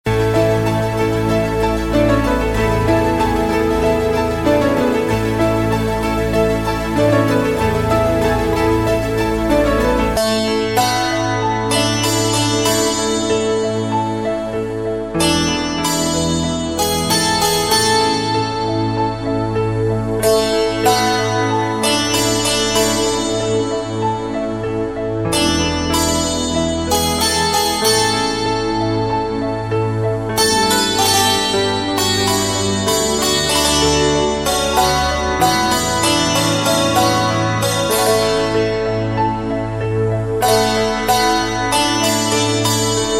Vandhe Matharam Instrumental

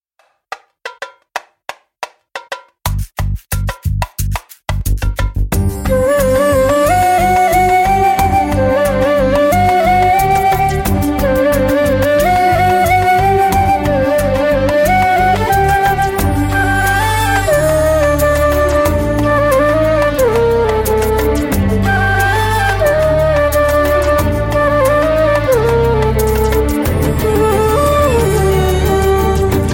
Theme Music

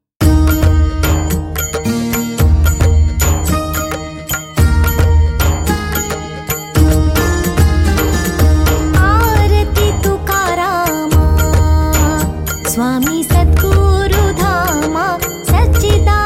Aarti

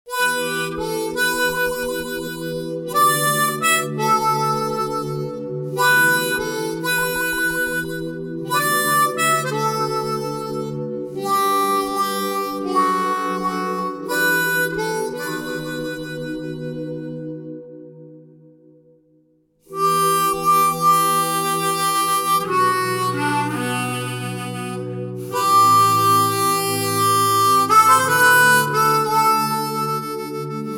Love Theme Music Remix

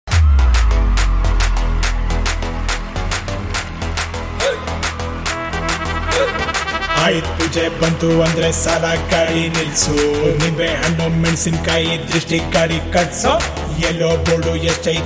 song promo

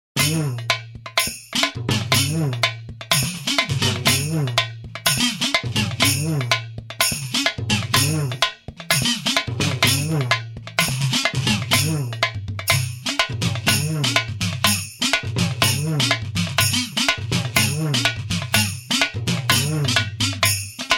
Pambai Udukkai

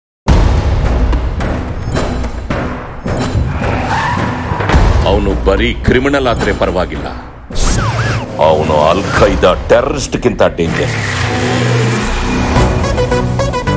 Theme Music; Trible Riding

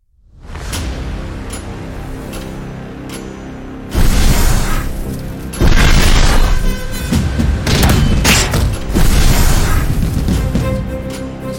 Theme Music; Raana

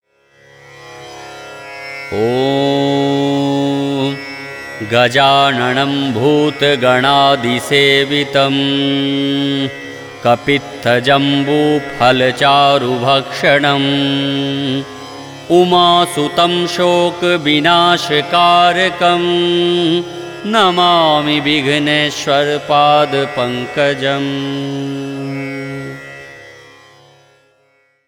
Ganesh Prarthana Mantra

